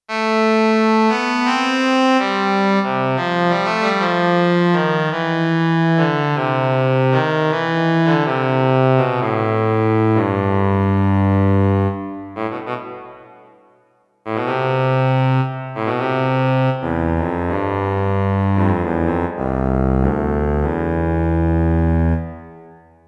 Cellancoly; Bank 2 card A

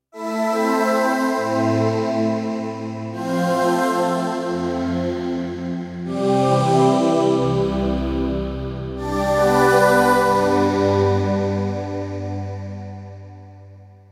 star choir; Kawai K1; Bank 2 card A

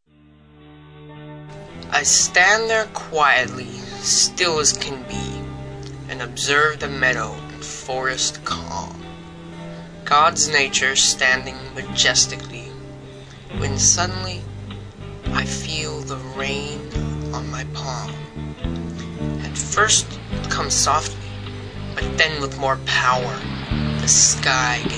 Thunderstorm; Silas Johnson

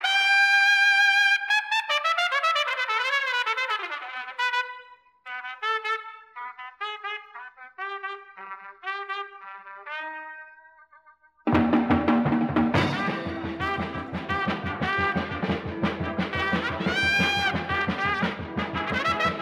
Shine

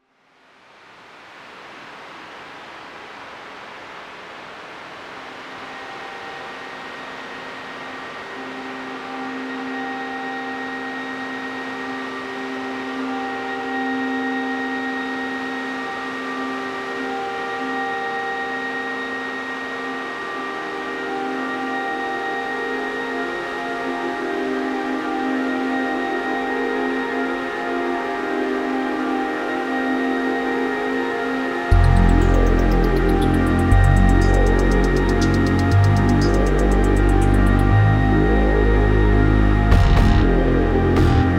rain falling

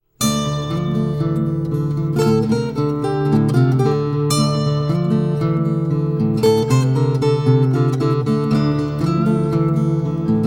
Instrumental

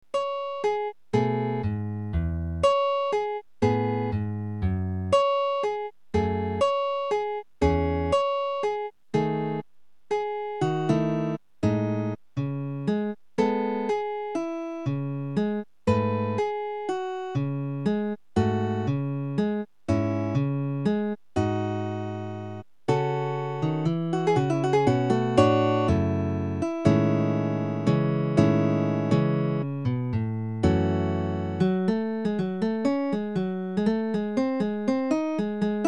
Prelude In C Minor

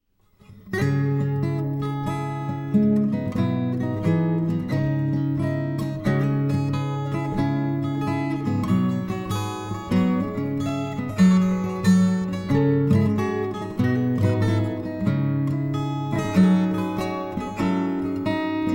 Instrumental

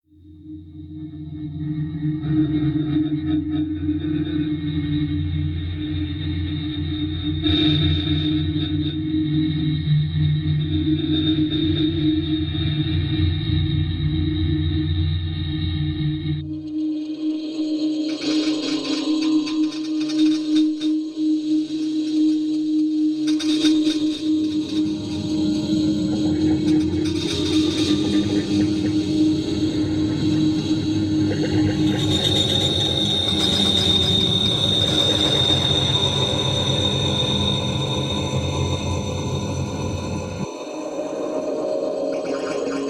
piano

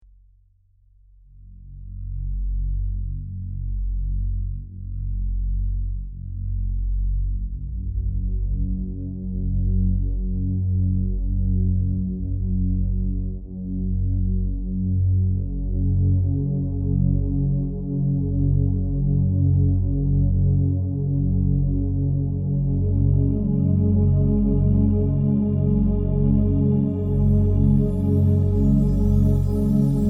intro musical ambience

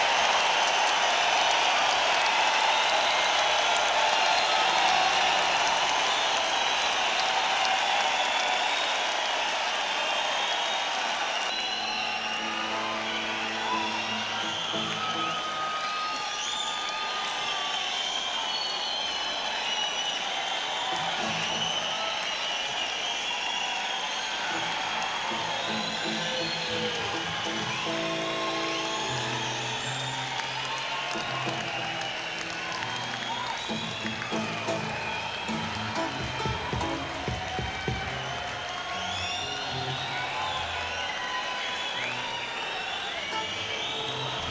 Encore Break; akg d330bt; senn421

